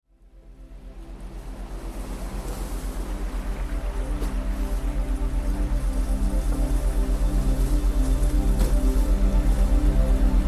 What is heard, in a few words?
sleepy sea